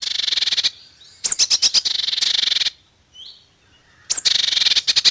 parus caeruleus

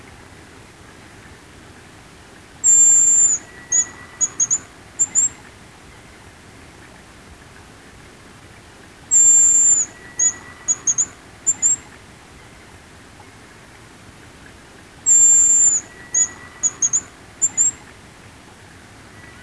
bonasa bonasia

